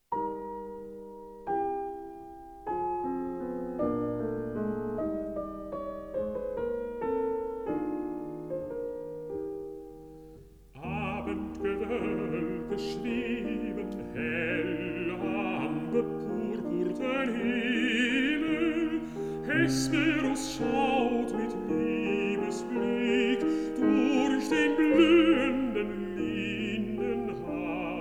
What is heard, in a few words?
song for voice & piano